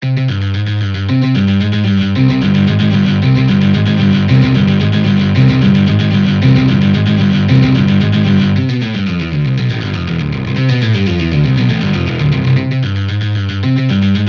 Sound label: Awesome Bass